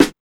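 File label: SD, snare drum; X-Static Goldmine 1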